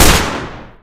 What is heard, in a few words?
pistol shoot; tf